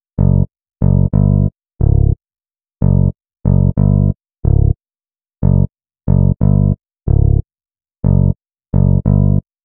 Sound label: Bass, Hip-Hop